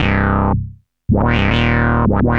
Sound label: ARP Odyssey, Arp A Line, synth samples